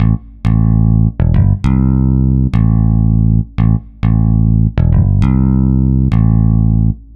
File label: Picky Bass; Dr. Fink's Funk Factory